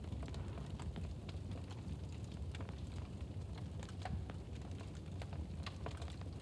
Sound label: BG Fireplace; blue archive